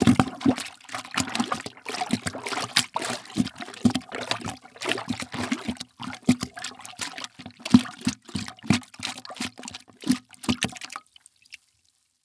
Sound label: Impact Plunger Plunging In Toilet